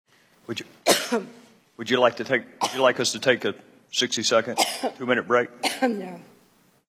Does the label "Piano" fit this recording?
No